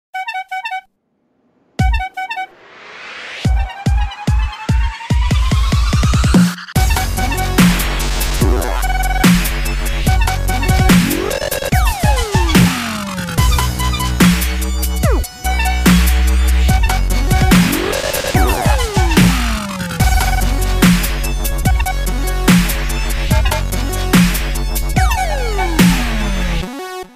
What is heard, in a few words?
Horn Dubstep; Iphone